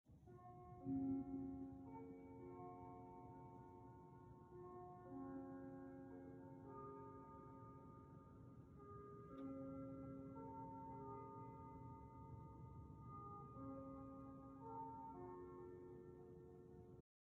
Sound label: chime; shirokane